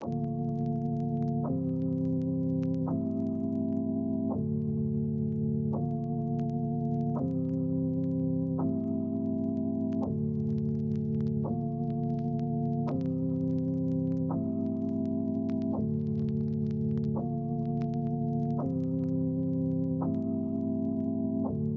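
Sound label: Organic Organs 84BPM D minor Vintage Melodic Dirty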